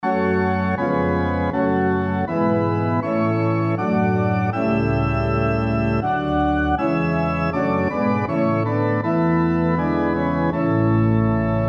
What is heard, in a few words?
SATB on Piano Example